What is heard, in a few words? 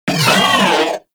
Moa Vocal Pain; Warframe